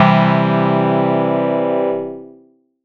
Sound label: Synth Grand Piano Chord; Big Fish Audio; OSH Chords